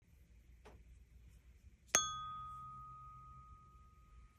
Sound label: Silver Tuning Fork, c note 128 hz